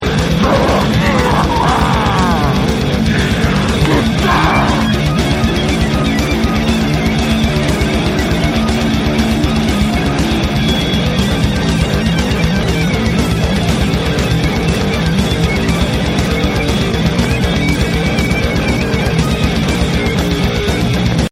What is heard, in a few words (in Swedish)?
guitarr